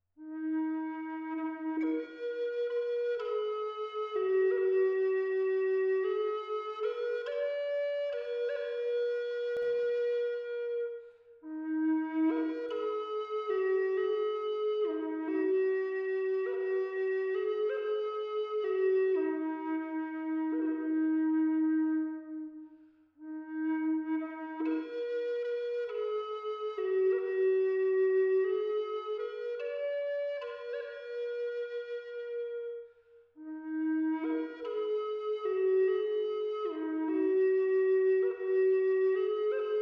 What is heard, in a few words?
Eastern Red Cedar E 432 Hz